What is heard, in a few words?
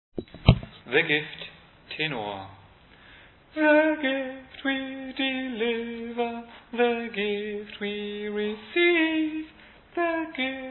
Tenor